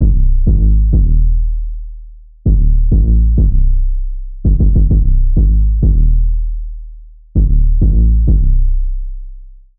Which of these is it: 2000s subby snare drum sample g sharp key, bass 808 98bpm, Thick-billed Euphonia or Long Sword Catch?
bass 808 98bpm